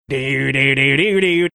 singing opening

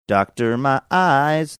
singing

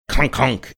making time card puncher sound

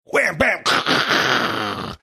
making mr tough guy sounds

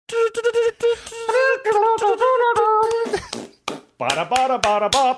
singing funny fanfare

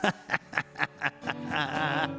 evil laugh

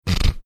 snorting

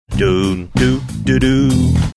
singing first few notes

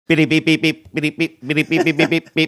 making breaking news teletype sounds

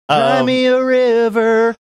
singing

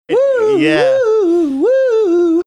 singing portion of refrain

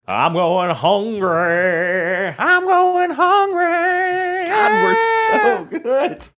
singing im going hungry

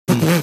pffft

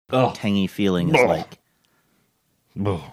making shuddering sounds

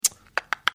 tongue clicking sounds

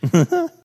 cut short chuckle

